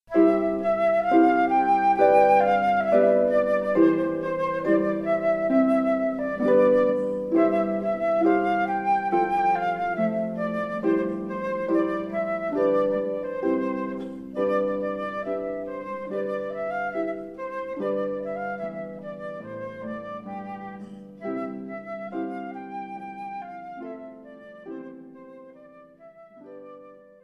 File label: with harp, Flute; Ode to Joy